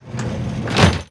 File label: Train Door Close; Playable Tutorial Level